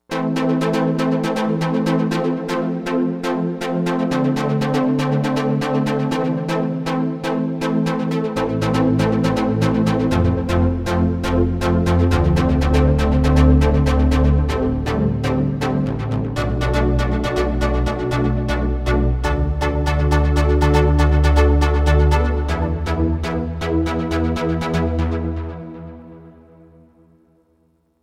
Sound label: Club Stack